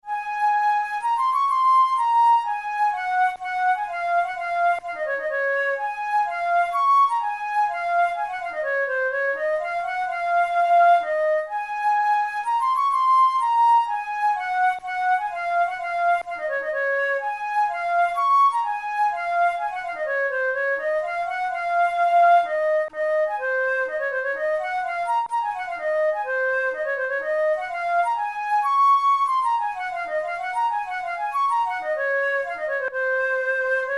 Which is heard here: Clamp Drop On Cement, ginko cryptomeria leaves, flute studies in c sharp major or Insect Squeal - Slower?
flute studies in c sharp major